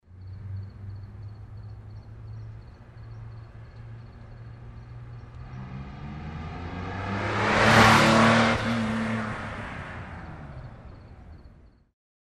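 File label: sports car passing nearby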